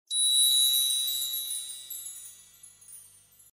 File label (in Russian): effekt chistoty